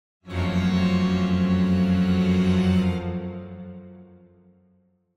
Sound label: Symphonic Hit Strings Calls - Semitone; Hollywood Edge; sound designer's toolkit v2